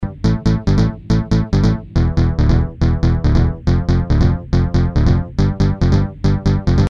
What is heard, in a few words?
phat bass; A phat bassline with a little bounce.